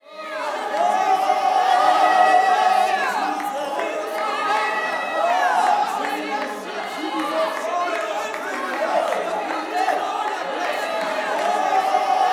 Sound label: WORSHIP, Gospel; Spectrasonics; Vocal Planet Vol.1 Gospel